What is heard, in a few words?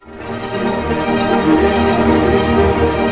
ARP D# MJ PP, STR ARP MAJ; Sound On Sound - Peter Siedleczek's Orchestra